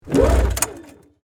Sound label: Snowmobile Pull, Sports, Snowmobile, Pull, No, Start, Yamaha, Exciter, 440; Miscellaneous Sport; BlastWave